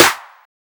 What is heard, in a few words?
long tail reverb snare sound e key